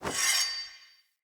Weapon Fragment Pickup

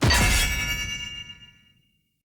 Short Sword Medium Parry Perfect Counter; Weapon Impacts; Player; Swords; Combat

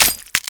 Modular Secondary Handle Reload, Guns; Warframe; SU Modular Guns; Reloads

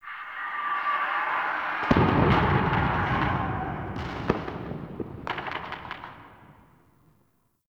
Ambient Distant Infested Explosions; Nineteen Ninety Nine

